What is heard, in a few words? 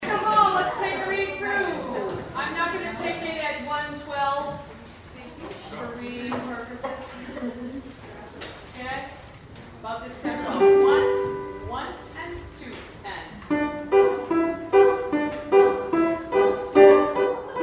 deck hall